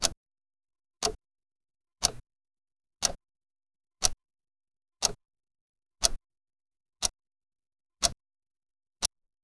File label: clock tick